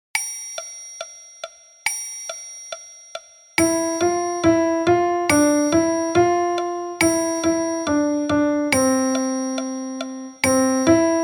Piano Version; Mollamamadjan; ملاممد جان

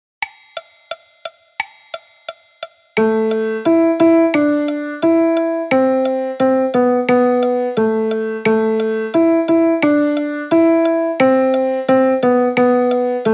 Piano Version

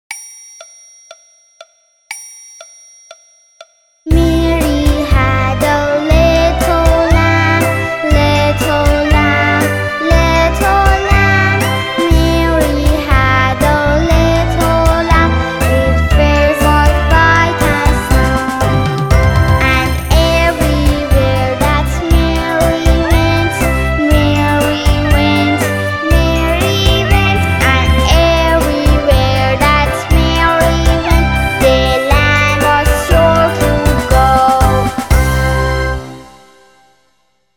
Mary Had a Little Lamb Vocal; مری بره ای داشت

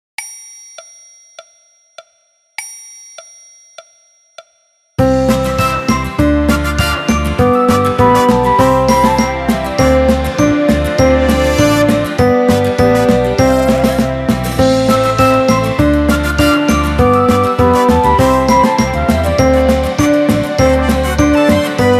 Piano Orch

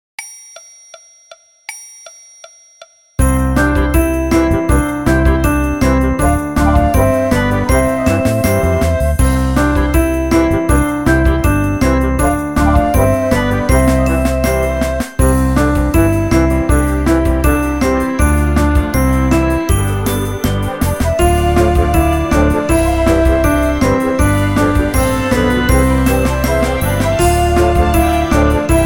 Piano Orch

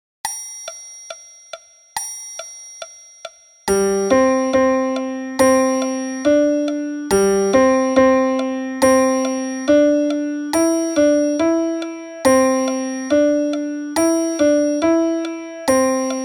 Piano Version; Chek Cheke Baran 1

